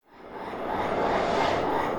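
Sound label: Music dpad Black Hole 120; from dust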